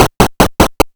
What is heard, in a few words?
Hard PCM Chip; chiptune